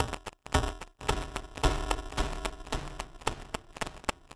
110 Driven, Percussion (Stereo); Loops; Touch Loops; ElementsOne; Abstract Rhythms

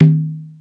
loco, percussion; drum

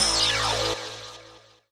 SPACE SYNTH 140 A; Keys; SpaceSounds

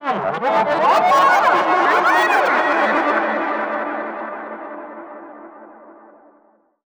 TECHNO FX 140

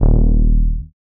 Bass, One Shots; Touch Loops; BlissedOutBeats

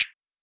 hat; Anthemic

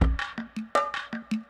PERCLOOP ETHNO 160

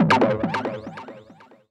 TECHNO EXTRA 140

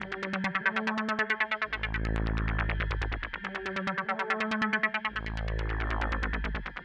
SPACE PAD 140 A; SpaceSounds

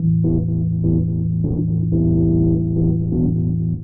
DEEPHOUSE ORGAN 125 A

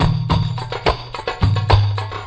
TURKISH 109, percus loops

